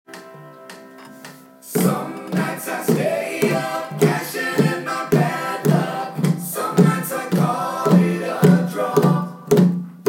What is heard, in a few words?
African drum